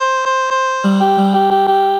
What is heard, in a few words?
Midi Note Duet, Transformed Voices; Voice Box; Chromatic Voices